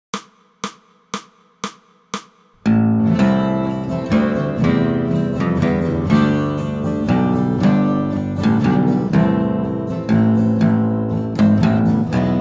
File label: Bass Solo; What Child is This? (bass solo)